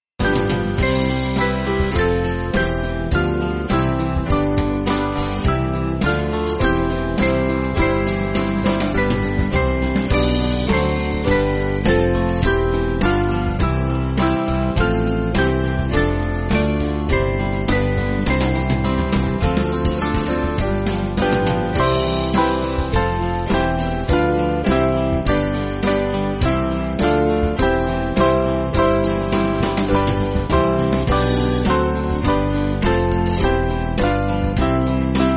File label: Band, Music Backing Files for Churches; Everyone Extol Our Lord; Trallwm